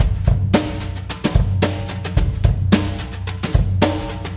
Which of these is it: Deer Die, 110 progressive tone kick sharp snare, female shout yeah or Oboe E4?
110 progressive tone kick sharp snare